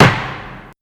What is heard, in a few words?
gavel